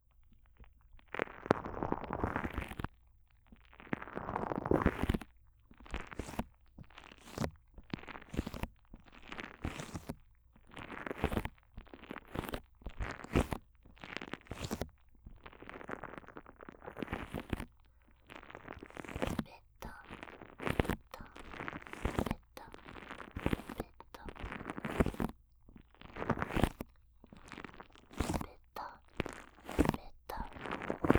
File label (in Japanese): マッサージ; 【睡眠導入】ゼロ距離音セレクション オノマトペ式ASMR